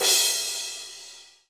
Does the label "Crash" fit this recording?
Yes